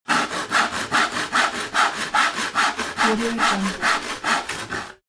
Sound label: foley wood sawing; smartsoundfx